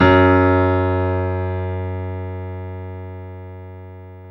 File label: PIA MICRO, MICRO-PIANO